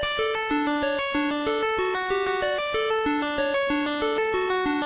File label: Mini Loop